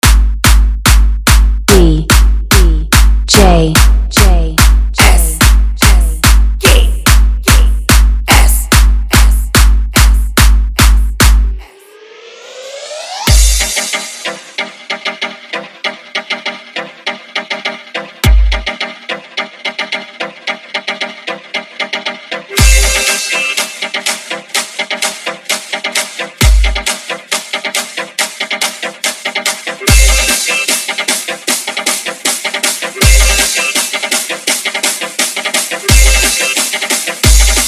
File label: sound trance; Odia